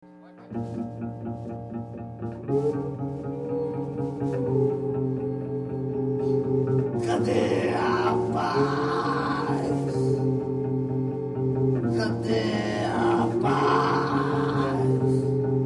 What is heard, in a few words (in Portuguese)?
punk